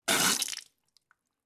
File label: Mouth spitting out blood